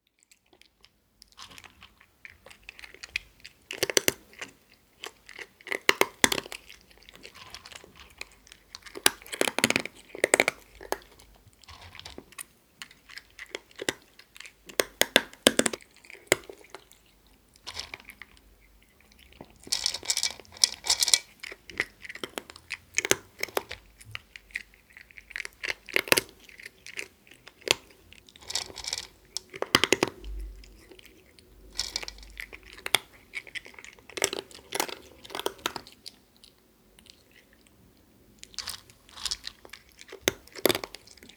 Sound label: Cat Eating Dry Food; SES Animals SFX